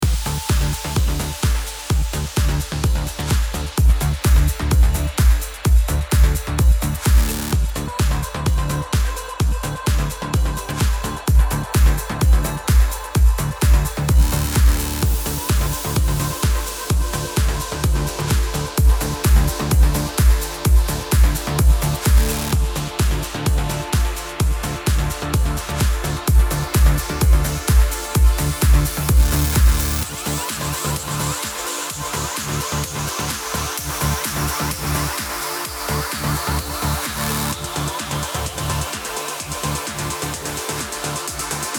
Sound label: EDM; boost